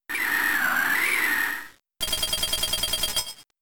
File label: Blizzard